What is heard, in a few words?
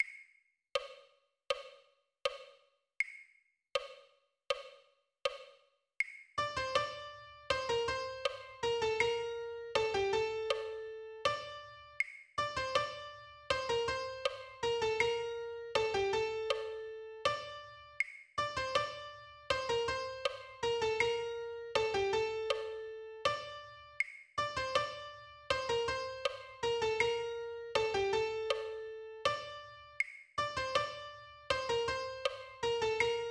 rhythmus 80bpm; Rhythmusübung Takt 7-8 in Schleife